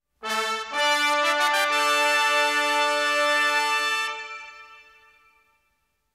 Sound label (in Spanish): fanfarria